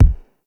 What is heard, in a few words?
Struggle Prompt Button Press; Warframe; The New War